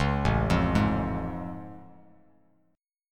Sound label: A Augmented Major 7th A Bass Standard; arpeggiated; JGuitar